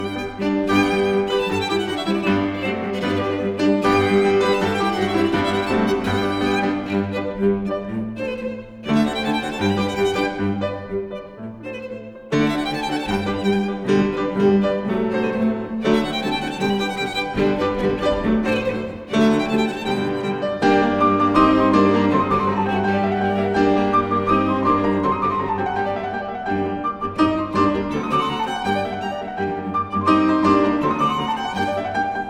Piano Trio; No. 39 in G Major, Hob. XV:25: III. Rondo all'ongarese (presto)

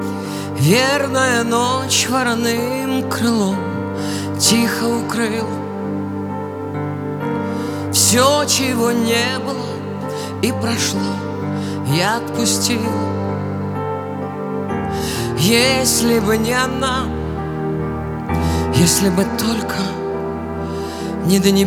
Acoustic Version

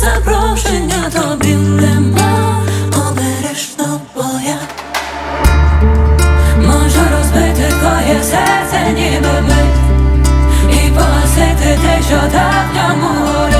Instinkt Acoustic

